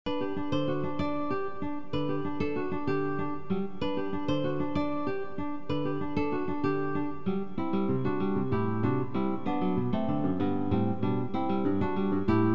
prelude guitar

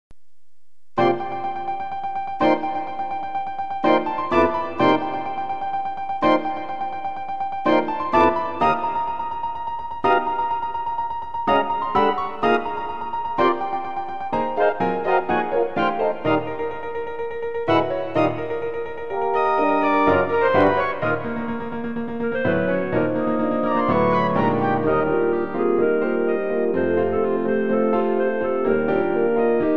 sextet ww pno; Third Movement; woodwind; piano

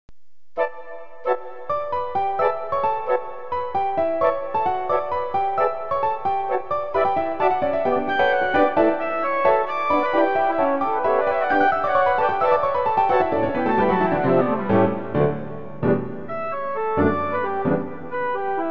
divertimento prelude

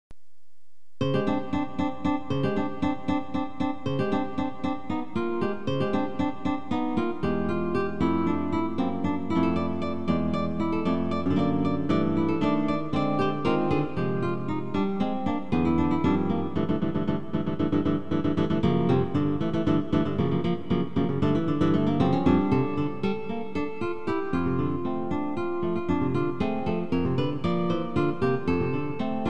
suite guitar

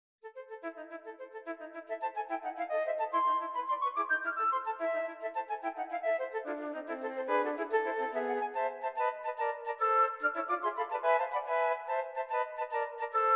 Tarantella 3 Flutes

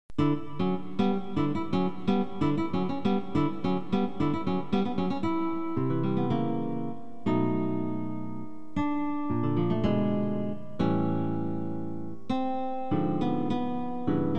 prelude guitar

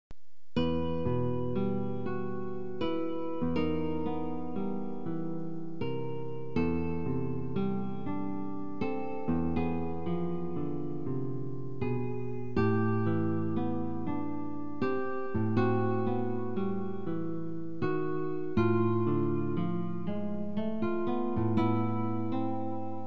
suite guitar

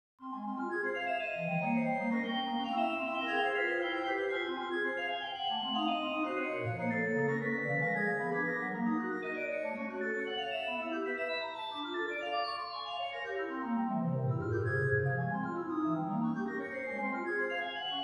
prelude

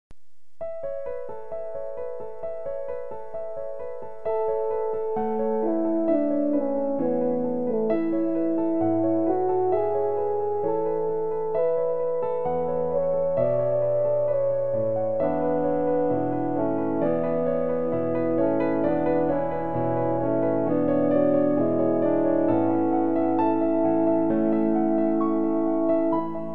sextet ww pno; First Movement

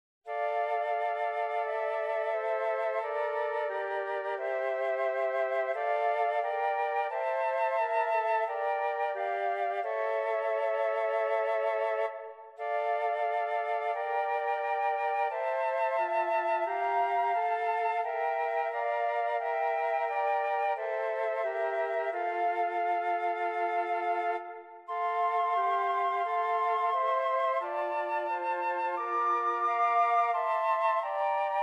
Chorale 3 Flutes

